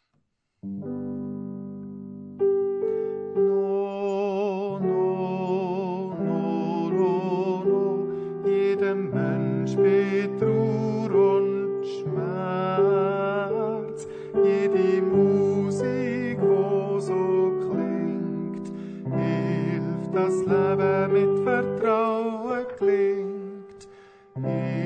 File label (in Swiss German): Tenor